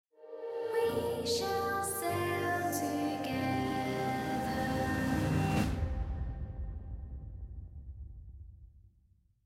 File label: Chest Sold; Athenas; Sea of Thieves; Mysterious Stranger; Chest of Legends